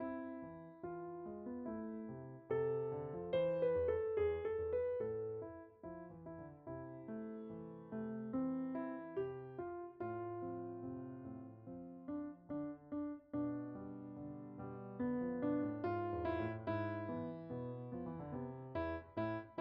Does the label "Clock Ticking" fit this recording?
No